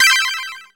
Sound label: chords, Synth